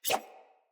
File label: dagger whoosh; Sinecure Dagger